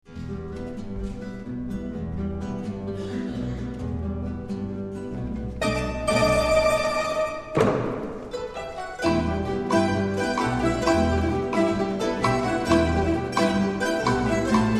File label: Rumba